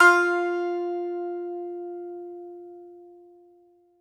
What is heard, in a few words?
BAGLAMA, Plucked Strings